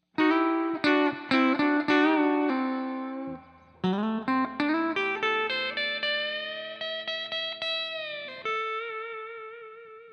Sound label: soft guitar solo